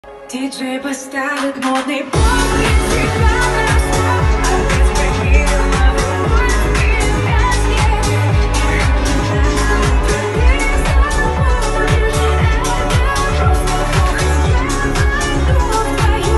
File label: pop; modnyy pop